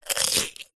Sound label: human eat potato chip single bite crunch; zapsplat